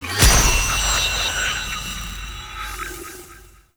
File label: Shrine Drop; Eidolon Plains Megalyst; Warframe; Teralyst